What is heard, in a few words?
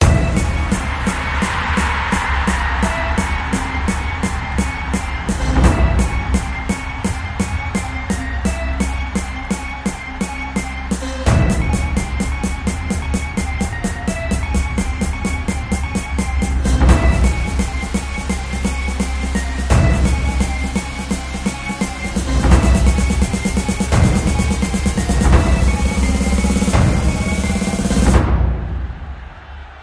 Bgm Ringtone; Rcb Wins